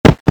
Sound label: zombiedog run; cso